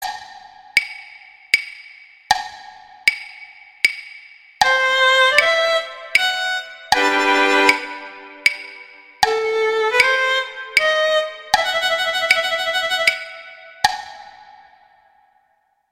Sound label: Introduzione Guitar; Paganini; Sonatas Op1 Vl Gu; Sonata No 4